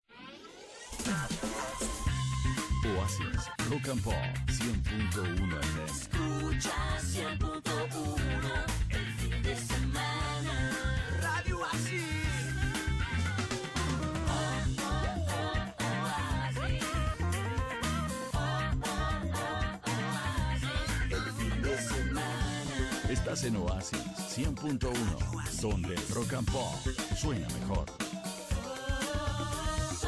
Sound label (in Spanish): jingle fin de semana